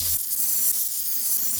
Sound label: rumble carpet bomb effect loop